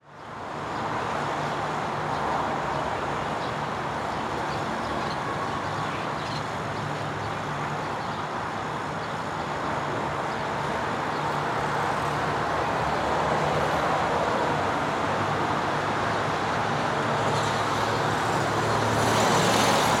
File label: City Rooftop; Blastwave FX